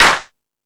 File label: Crash, Drum Machines E-MU Pro-Cussion